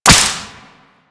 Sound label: Whip Impact Default, weapons; Grn Whip